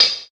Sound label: natural closed hi hat sample d key; hat samples